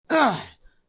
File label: player damage; LegendsOfCoyoteGulch